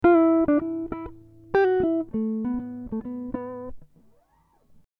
Em 100 GTR Riffs

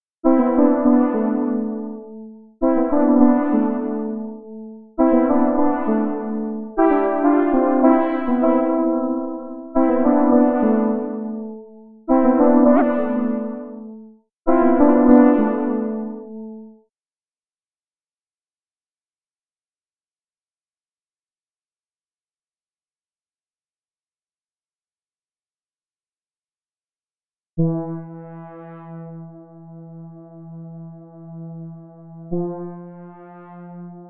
macfunk

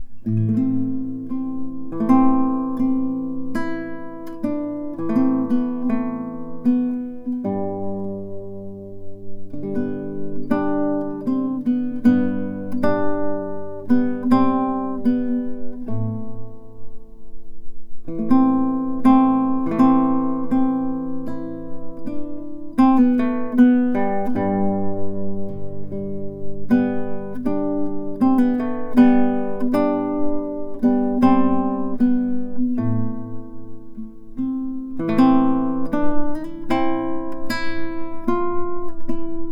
Guitar

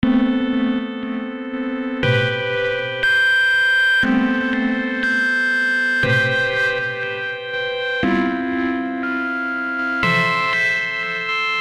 Am120 Rogue Chords

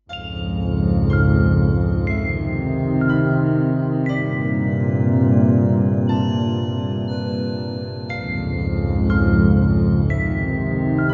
Spacey Dream sample pack

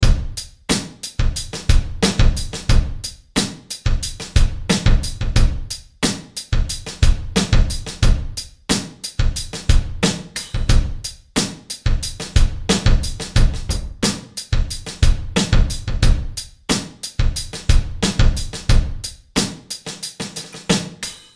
Beats and Effects

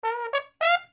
brass loop

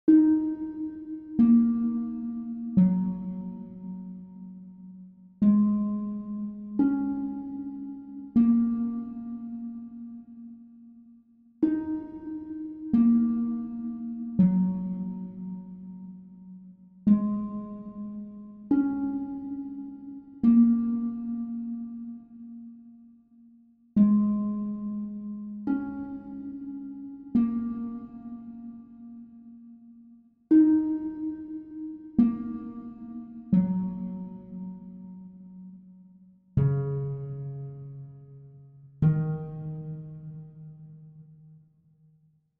Crystal Harp Loop

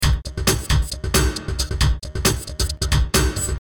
beat

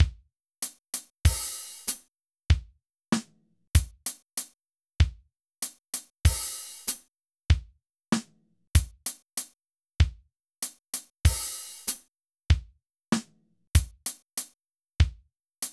drums 96bpm

